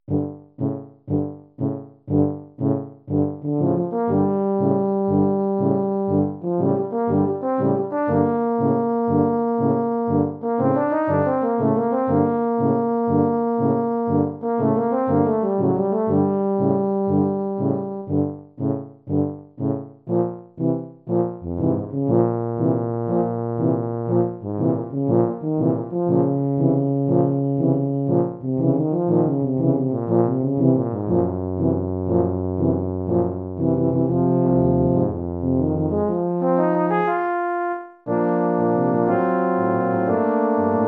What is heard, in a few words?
Tuba Suite March